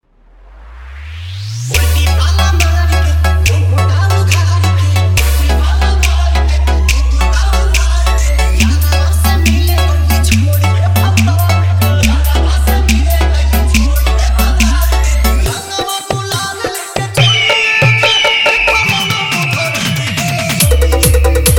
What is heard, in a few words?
Bhojpuri Holi Dj Mix Song